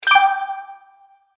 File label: Magic Purchase Ding; Cartoon Sound Design